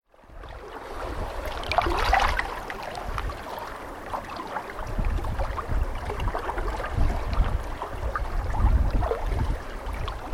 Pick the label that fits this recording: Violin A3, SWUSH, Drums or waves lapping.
waves lapping